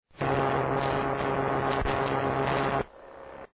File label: Spark simulation